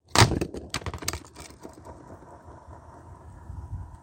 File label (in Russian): skejtbord